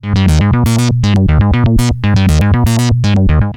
BBD Source; doepfer a-188-1

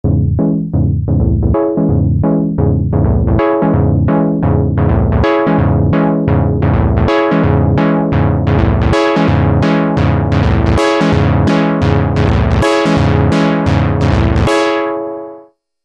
CHANNEL CHOR; mfb synthII

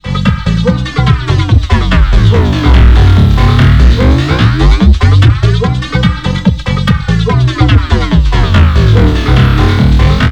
BBD Flange LFO; doepfer a-188-1

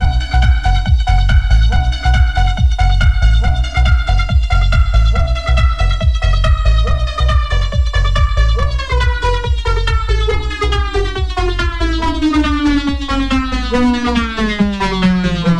BBD 1024 fullrange; doepfer a-188-1

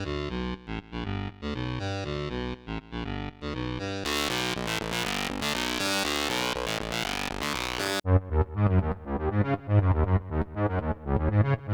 synth sample MODE1, fuzz

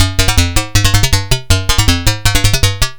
BBD Karplus; doepfer a-188-1

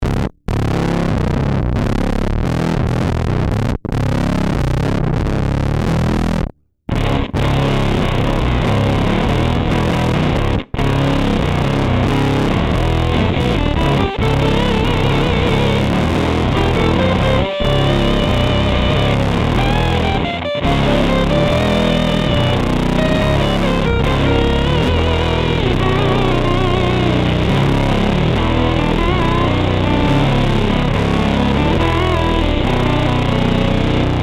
LOW WOODS AMPED, vintage fuzz master; devi ever usa

